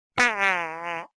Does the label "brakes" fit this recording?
No